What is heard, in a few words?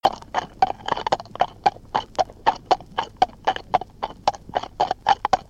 walking Short